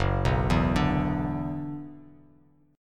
F sharp 7th Suspended 4th Sharp 5th, Bass Standard; 2,2,2,7; arpeggiated